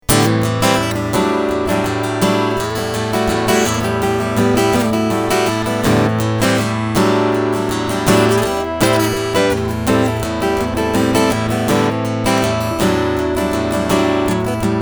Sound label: Strumming LINE